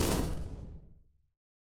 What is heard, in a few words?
retro game explosion